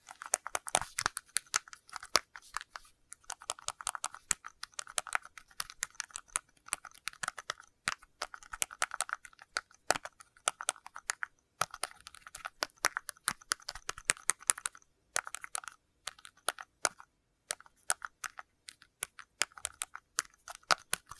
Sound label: Typing on plastic keyboard; may tinh xach tay